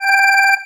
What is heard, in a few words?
alarm